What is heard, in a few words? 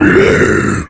death satyr; dota; creep deaths